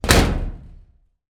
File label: impact metal object strike metal garage wall